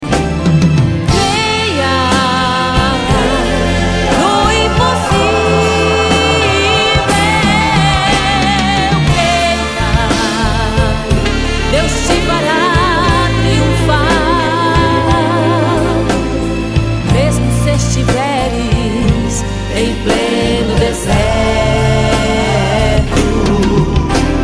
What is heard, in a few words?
ringtone